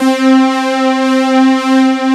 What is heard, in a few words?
Casio VZ1, A#4